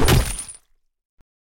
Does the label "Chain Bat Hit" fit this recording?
Yes